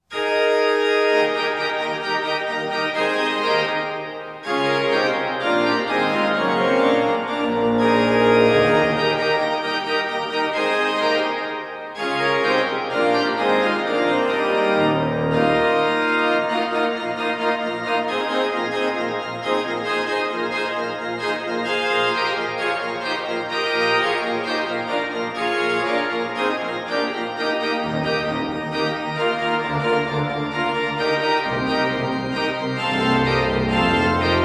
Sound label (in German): Choralvorspiel zu Nun danket alle Gott; EG 321